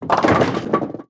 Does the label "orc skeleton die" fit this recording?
Yes